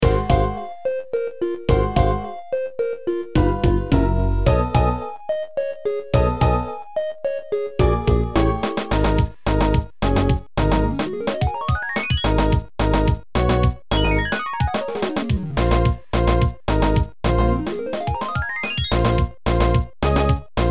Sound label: sea; PokeRogue